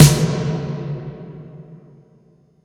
Electronic Snare; Yamaha PSR E305

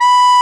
RECRD B4; AKAI S-Series CD-ROM Sound Library VOL-8

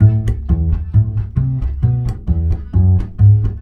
BASS, Balkanish Traditions

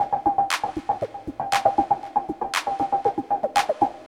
LOOP, Tekno Industrial; East West

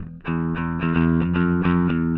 Bass, Indie Rock

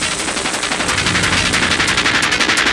Kaos FX, Ambient Grooves; Sonic Foundry (Sony Creative Software)

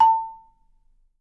A4, Percussion & Harp; Miroslav Vitous - Percussion & Harp

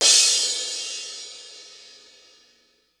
CRASH, Hip Hop Misc Perc; E-MU Producer Series Vol. 8 – Platinum Phatt

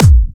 Clubby Kicks, Bassdrums

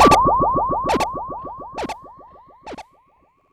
Acid Echo; Sonic Foundry (Sony Creative Software); Ugly Remnants VOL-2

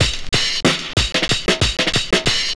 Slappy Loop, Drum Loops Vintage; Zero-G Smoke

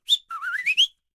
WHISTL, World Voices, ANDES; Spectrasonics Vocal Planet

